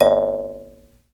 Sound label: JEWS HARP; Jew's Harp & Spoons